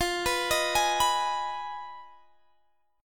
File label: F Minor 7th Flat 5th, Guitar, Standard; arpeggiated; JGuitar